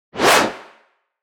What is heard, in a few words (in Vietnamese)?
am thanh vu qua toc do cao ngan